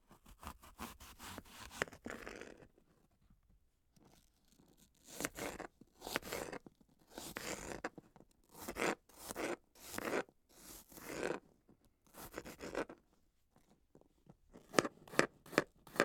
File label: Chopping Of Vegetables And Fruit Knife On Cutting Board